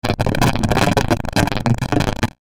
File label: static glitch; Static Glitch Soft Distortion; Production Element Imaging Element Accent Transition; Blastwave; Static Sound Effects